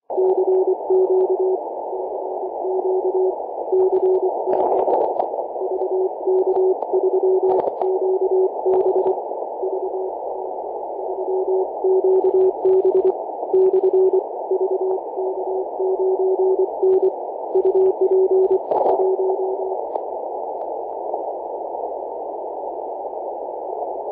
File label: 18.073mhz cw; vk3qb vk9n